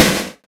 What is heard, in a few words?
SNARE GAT, snare fx; Big Beat Samples & More; AKAI